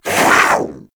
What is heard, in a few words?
Kavat Vocal Attack Short; Warframe; Warframes; Khora